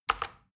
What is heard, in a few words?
plastic button tiny click regular fast